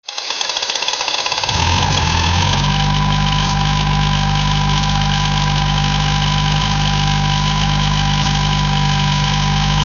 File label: create a v16 engine for, vehicle